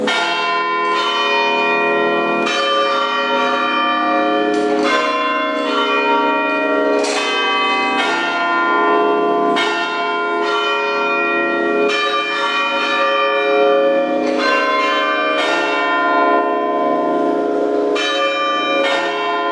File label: bells amazing grace